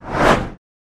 Car Oncoming By Whoo

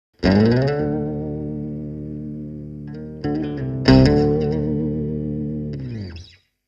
Electric Guitar Low Tone

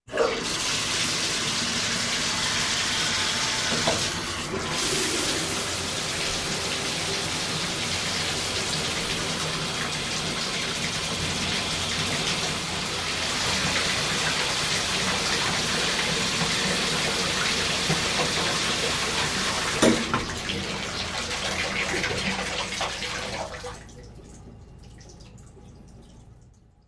Shower Turn On Run Tu; Shower Turn On, Run, Turn Off

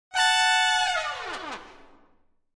Horn Section Slide, brass trumpet

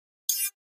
Flash Gear HF Elect Bu, flash gear

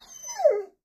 Dog Whines High Pitch

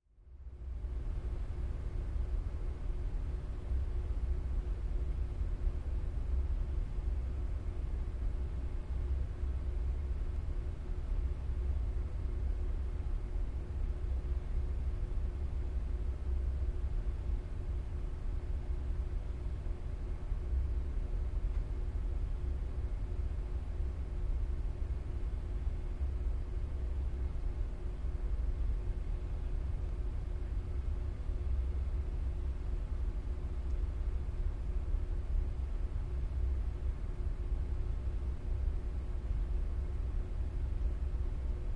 Quiet, Large And Airy With No A / C, Cold.

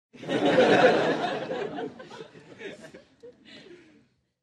Crowd Short Laughter, medium

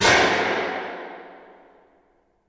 Gun Shot Dist Lng Hall, weapons

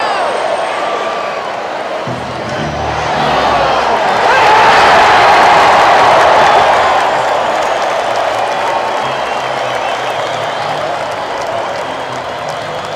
Crowd Cheers Sudden

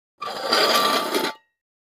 Coffee Can Scrape On; barrels buckets & cans

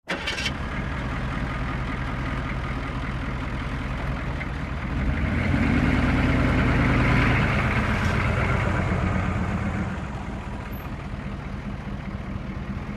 Humvee: Various Driving Maneuvers.

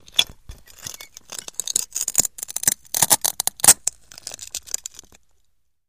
Glass Grinds Creaks; windows & mirrors

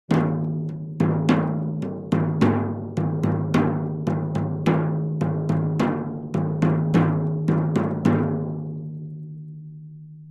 Drums Thin Toms Rhyth

